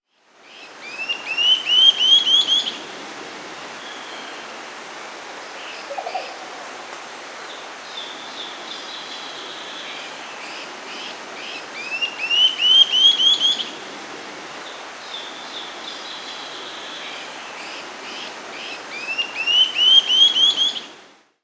Olivaceous Flatbill (Rhynchocyclus olivaceus), Peru; Birds of the Amazon; S. America